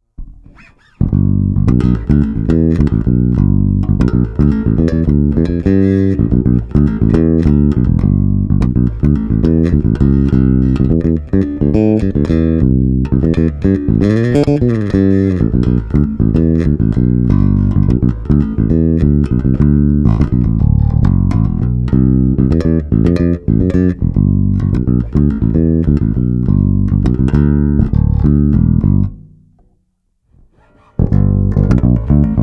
finger direct

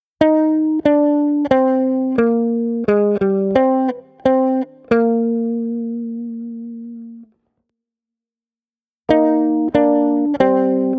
Bach Harmony

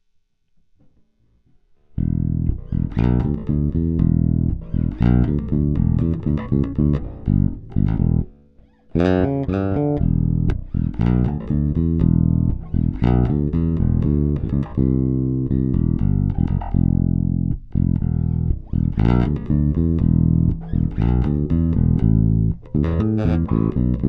Super Ferrites fingerstyle; pickups

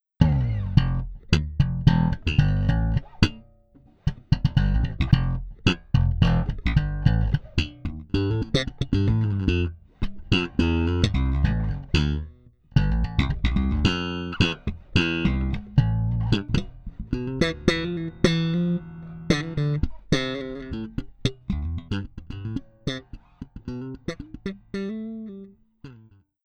SLAP BASSLINE; mike lull

